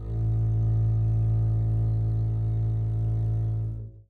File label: a1 down, bass arco; meatbass